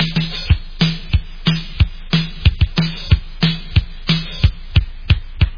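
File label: drum melody rock n roll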